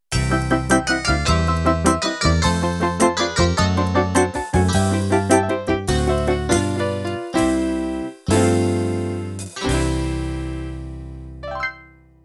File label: Rockabilly End